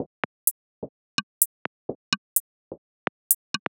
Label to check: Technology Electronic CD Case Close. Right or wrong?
Wrong